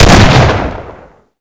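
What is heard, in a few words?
double barrel, shotgun; scmod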